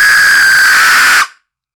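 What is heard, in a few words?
far assault rifle shoot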